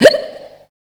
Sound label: FEMALE, Dance Vocals